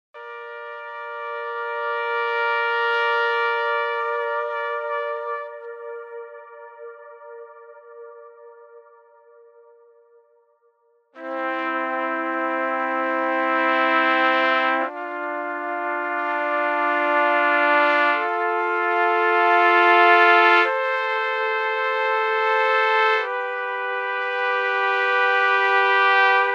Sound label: only Trumpets Fanfare